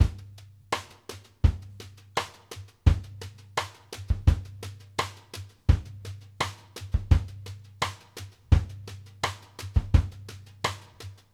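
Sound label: brush, ballad, drums; Sampleheads; New York City Drumworks VOL-1; SP BALLAD 84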